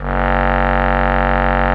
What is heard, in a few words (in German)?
Bass Klarine; Best Service ProSamples vol.55 - Retro Sampler; AKAI